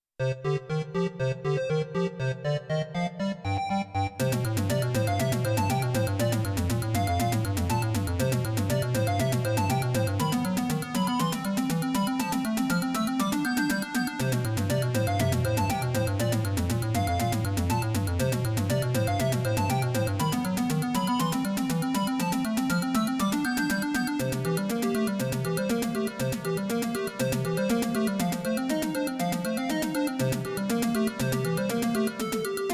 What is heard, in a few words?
Arcade Theme, MT-32; Wing Commander I; PingBeeper